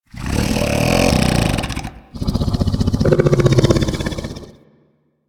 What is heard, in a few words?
Vocal Snore, critter; Warframe; Hunting Critters; Undazoa